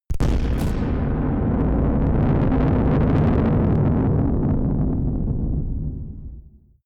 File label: distorted explosions